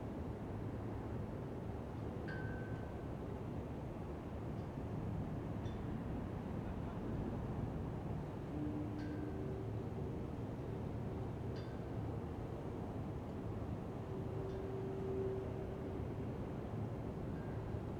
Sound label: docks generic